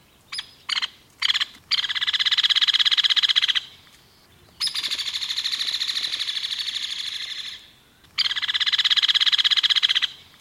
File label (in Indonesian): suara hairy woodpecker